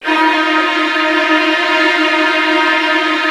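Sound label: Virtuoso Strings, violin trills, D#1; ILIO; Kirk Hunter Virtuoso Strings VOL-1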